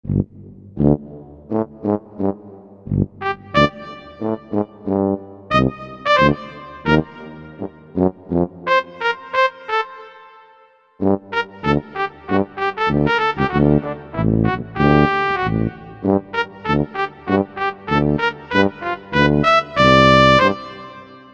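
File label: Tuba Trumpet, Korg MSC-11S Brass; Tuba-Trpt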